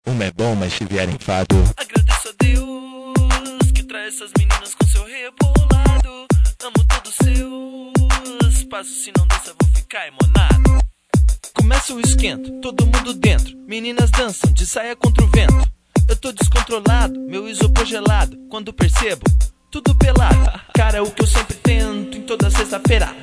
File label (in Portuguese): vinheta